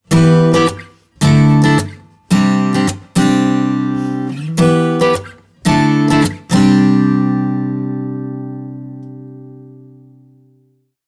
Acoustic, ringtones; Marshall; London KB 1501

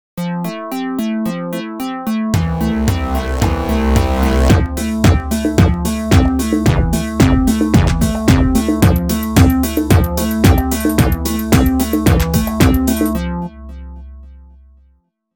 ELECTRONIC, Windows Mobile ringtones; Microsoft

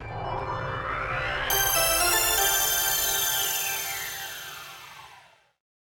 Power On; LG; Optimus-Vu-F100S-NewFW

